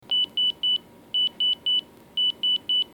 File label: alert; ems